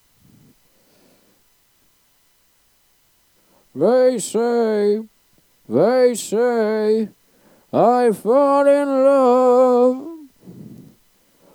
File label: bridge echo